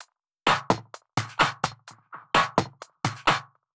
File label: Loop, 128 BPM